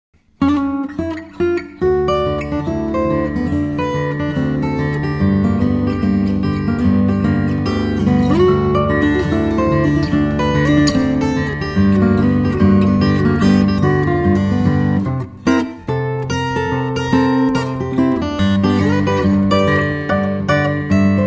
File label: hula blues, guitar